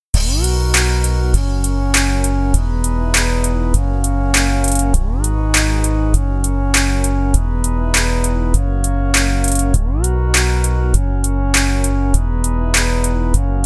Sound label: EDM TRAP